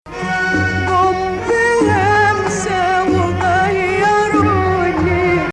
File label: Rast Nahawand